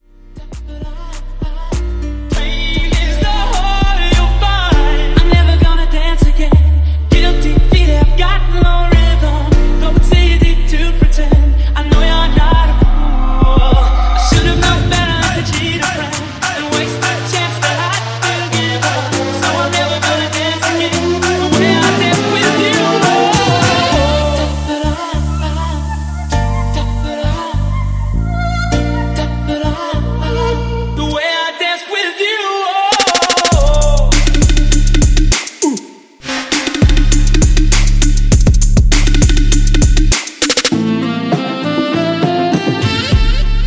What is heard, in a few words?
Hip Hop Dubstep; Remixfreakz